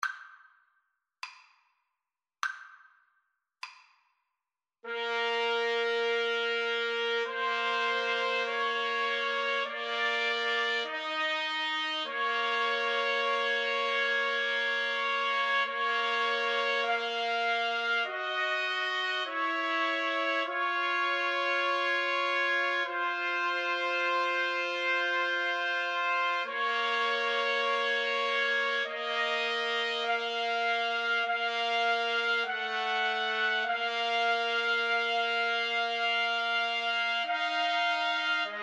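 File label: trumpet trio, karaoke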